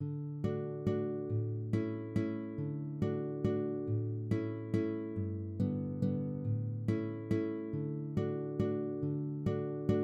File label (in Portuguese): VALSINHA PARA VIOLAO